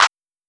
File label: Clap; Claps